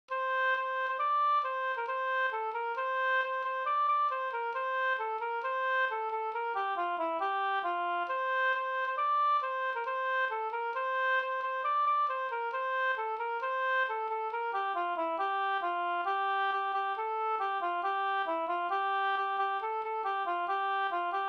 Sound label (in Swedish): vismelodi Sanglek; Simon i Salle